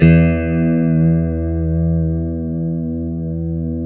E JC120; Spectrasonics; Hans Zimmer Guitars VOL-1; JC-STRAT MF

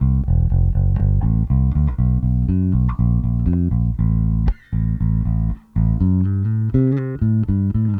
Bass, 70s Analog Funk; Sonic Foundry (Sony Creative Software); What it Is!; Classic Caddy

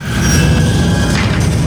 sfx sci fi; Masterbits - Switch FX

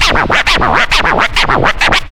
scratch; Zero-G; Freak Beats

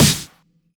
Snares; Vengeance - Essential Clubsounds Vol.4

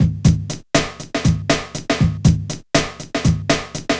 NRG Break, Trance, Drums, Breaks; Sonic Foundry (Sony Creative Software); Trance NRG VOL-1

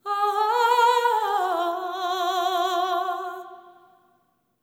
LAMENT

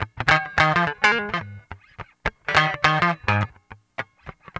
GUITAR, Funky Ass Loops; East West

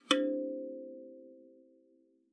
KALIMBA C5; Xsample VOL-7

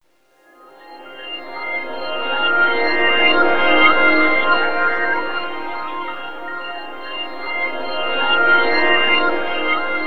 Pads, Electro Lounge; Sonic Foundry (Sony Creative Software); 081 Laser Love

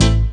Piano Bass; Best Service Gigapack 1

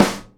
SNARE, Live Snares

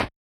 Yamaha DJX 2B, SD Elect.

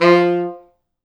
F2, Pop Brass; Best Service ProSamples vol.19; AKAI

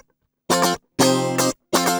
GUI 120 5bF; Best Service Gigapack 2

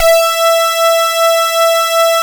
LEAD BRS E5; Drums In Your FACE!; LEADBRASS

